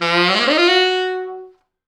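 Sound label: tenor sax runup, F#3; East West; Quantum Leap Horns Sax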